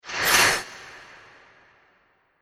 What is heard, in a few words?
Strong Rippled Whoosh; sound design; Whoosh Sound Effects